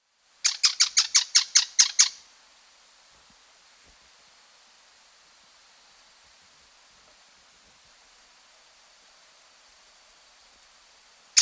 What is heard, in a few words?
Slaty-Capped Flycatcher (Leptopogon Superciliaris), Andean Birds; S. America